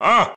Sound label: evil laugh; K. Rool